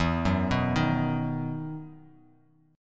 E Major 7th Suspended 2nd E down Bass Standard; EM7sus2 arpeggiated

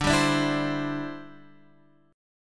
D 7th Suspended 2nd Suspended 4th, Guitar Open F; x,9,7,9,7,7; strummed; JGuitar

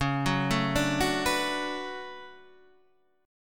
D Major 13th Db, chord

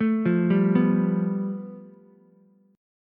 A 7th Suspended 2nd Sharp 5th, Ukulele; A7sus2#5 arpeggiated; JGuitar

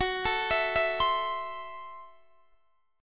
Gb Minor 7th Flat 5th Gb Guitar DADGAD; x,21,19,21,19,22; down; chord shape

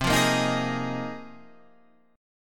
Bb 7th Suspended 2nd Suspended 4th C

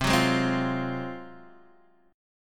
G sharp 13th C

